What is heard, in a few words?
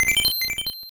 Stereo 1up; super spy hunter; nes